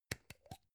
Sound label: gum plastic box open soft